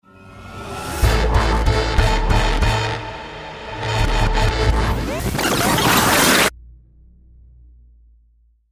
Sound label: Elevator Death